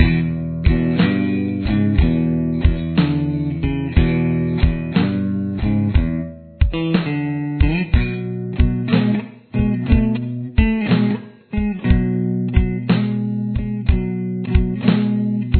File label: rhythm verse, guitar